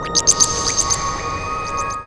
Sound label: Click Pad; Jungle; Pads; Zero-G Jungle Warfare 1, 2 & 3; 90 s Sample CDs